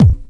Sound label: DR. LOOP; X-Static Goldmine 3